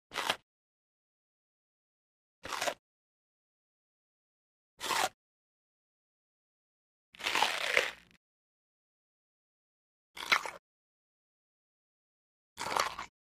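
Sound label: biting crunch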